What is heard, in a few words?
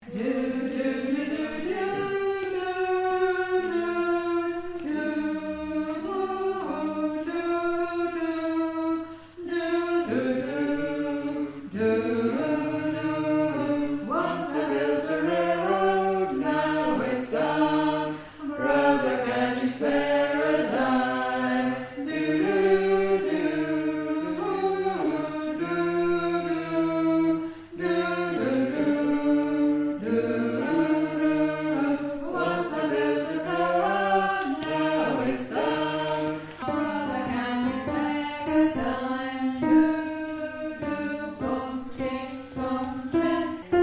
Alto, singers